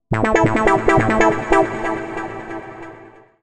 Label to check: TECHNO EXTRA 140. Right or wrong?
Right